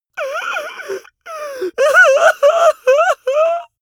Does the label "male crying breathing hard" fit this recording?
Yes